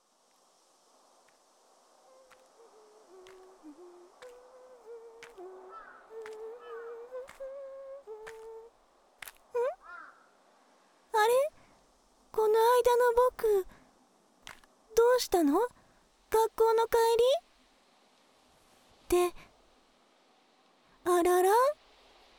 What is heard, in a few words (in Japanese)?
フェラチオ